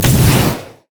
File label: overdrive; LoL; blitzcrank iblitz